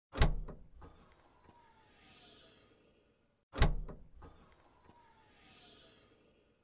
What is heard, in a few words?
Car trunk; باز شدن; صندوق عقب ماشین